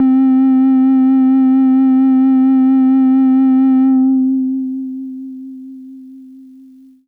SYNTH

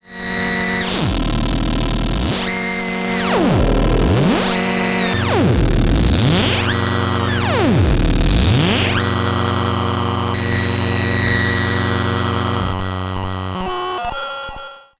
WEIRD Grind Worm

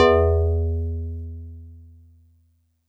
Piano DX100 E2; Star Piano

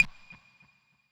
Clave Hard Verb

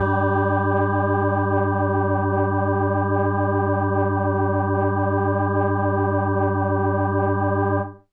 Indie Organ Kawaii G1

